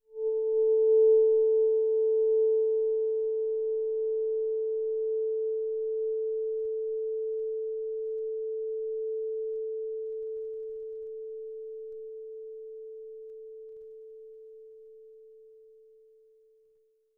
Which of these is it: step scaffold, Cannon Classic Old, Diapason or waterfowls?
Diapason